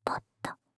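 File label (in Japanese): ポット, 無声音ASMR, バイノーラル音声; シャッフル睡眠ランダム再生用; シャッフル睡眠法で眠ろう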